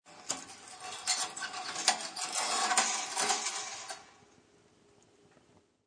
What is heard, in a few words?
Metal fireplace curtain is pulled and rattled.; Fireplaces; Household